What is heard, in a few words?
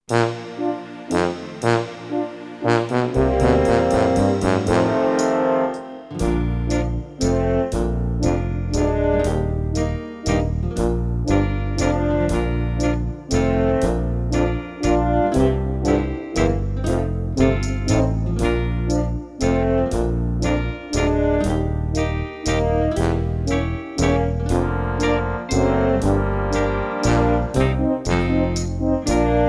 Clarinet Walzerrag to play along